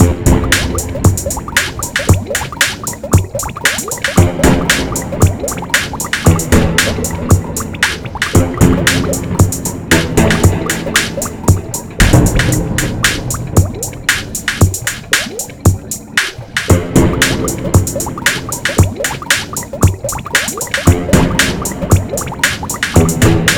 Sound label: water rythm